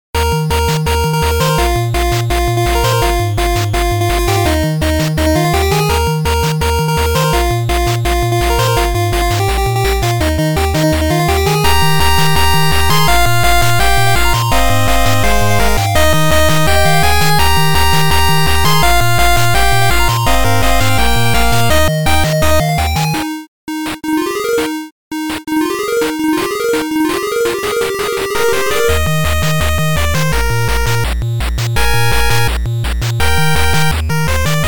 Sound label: NES Chiptune